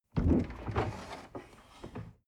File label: dishwasher door open